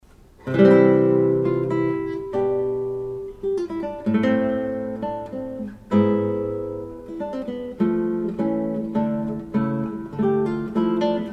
Largo Espressivo, guitar; Potpurri En Caprice Opus 32; Luigi Legnan

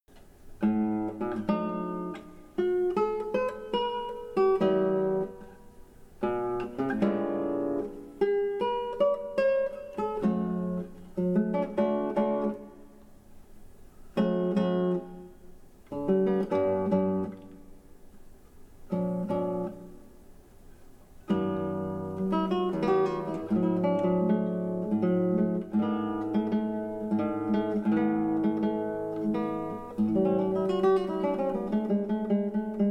Piccola Fantastia, guitar; Pensieri Melodici; O. Bongini

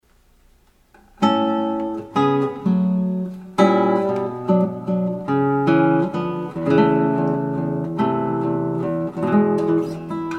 el mensagero valse, guitar

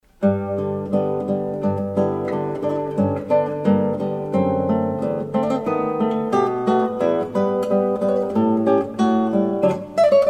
Allegretto, guitar; Marie Opera Revue No 7; Mertz

